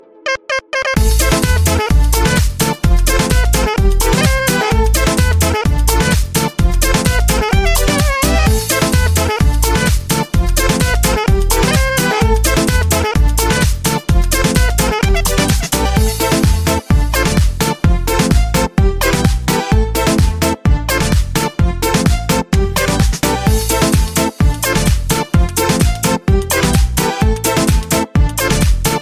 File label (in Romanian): muzica